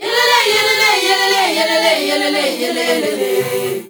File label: ZULU CHR, FEM. CHOIR